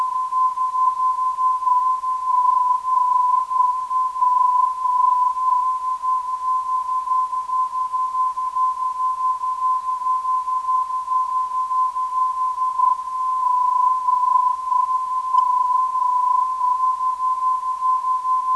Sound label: 393kHz, ndb; EGG